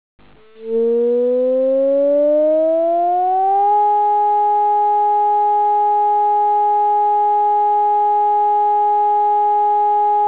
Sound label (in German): Entwarnung, sirenen